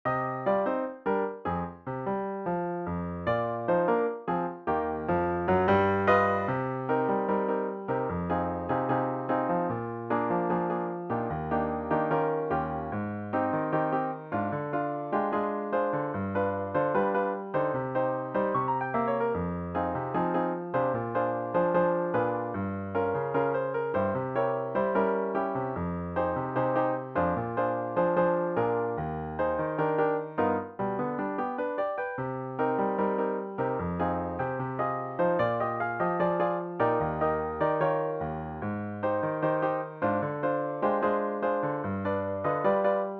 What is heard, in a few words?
Piano